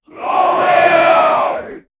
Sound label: marching group medieval, warriors